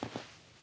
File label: snow step